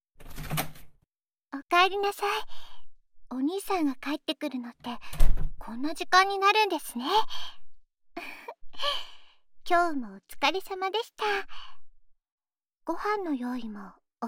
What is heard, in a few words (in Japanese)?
射精のお手伝い、してあげますね？（耳舐め手コキ）, ASMR